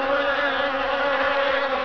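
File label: FLIES, insect; 昆虫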